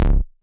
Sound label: nasty stormfront, Electro FX